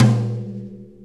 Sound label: Brush Tom; Sonic Foundry (Sony Creative Software); Drum Tools; Brushes